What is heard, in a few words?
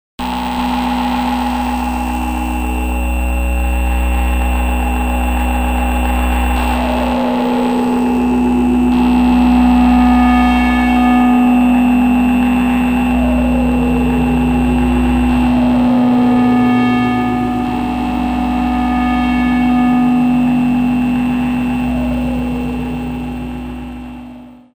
Absynth Drift away